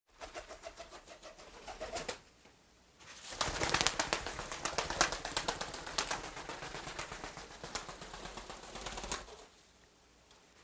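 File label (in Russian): голуби летают в большой клетке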